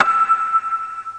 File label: space percussion; Weird and Interesting Hits; single hits